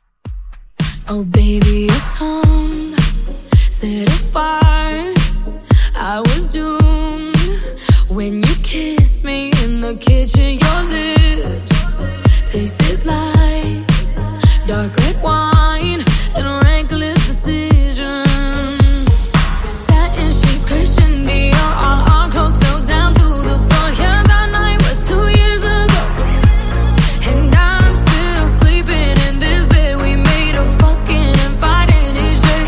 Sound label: Redrum 110Bpm